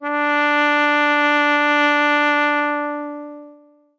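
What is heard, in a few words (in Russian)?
труба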